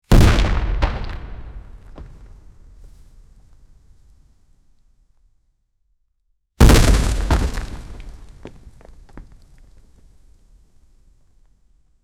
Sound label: a man falls and explodes